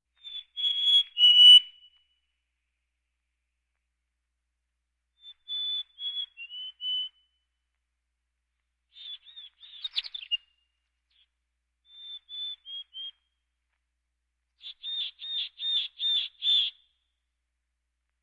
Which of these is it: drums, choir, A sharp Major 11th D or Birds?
Birds